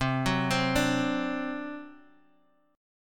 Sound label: C Suspended 2nd Flat 5th C Guitar Half Step Down